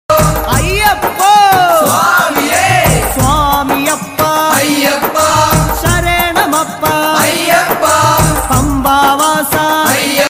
swamiye ayyappa, tamil ringtone, ayyappan ringtone